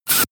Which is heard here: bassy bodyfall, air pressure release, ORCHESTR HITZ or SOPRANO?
air pressure release